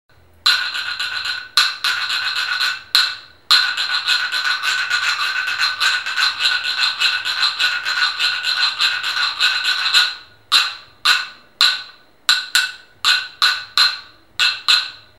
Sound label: Reco-reco